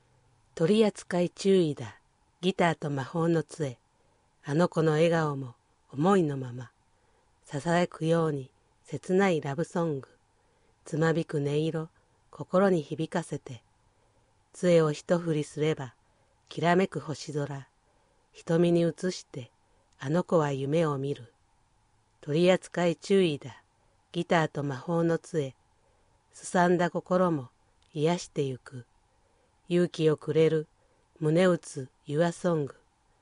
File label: guitar